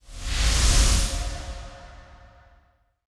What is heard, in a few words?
item showcase sticker; ui; Counter Strike Model Pack; CS:GO; panorama